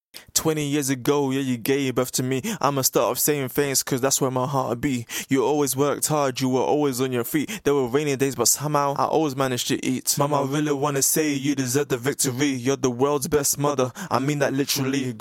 rap 95 Dm, Acapellas

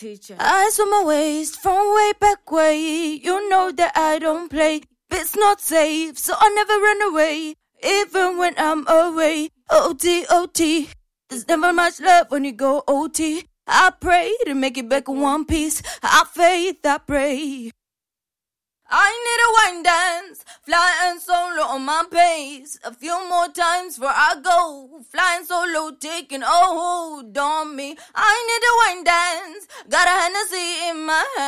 pop R&B 105 CM

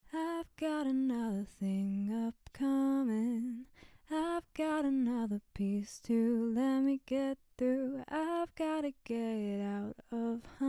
pop 120 Bbm

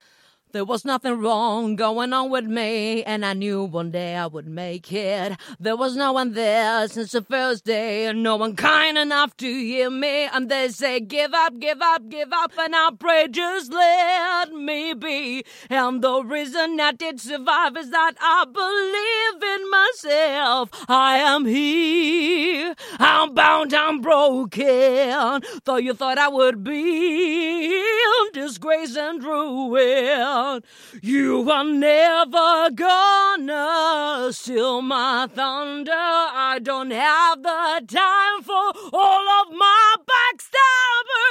Hip Hop pop rapsing 93 CM

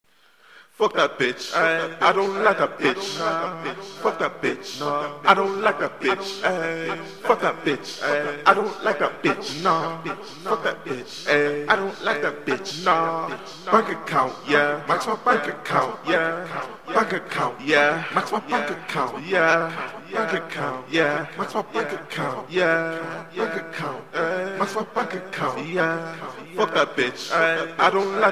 trap 149 Em; acapella

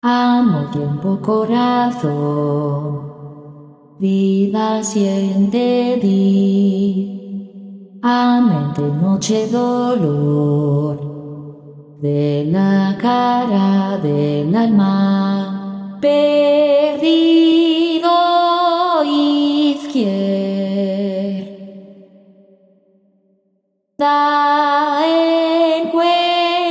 ambient 120 G, Acapellas

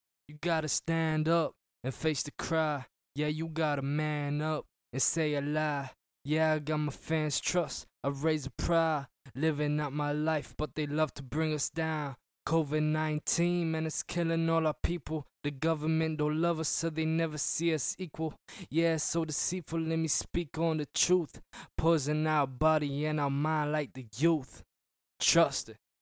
hip hop 78 Dsharp

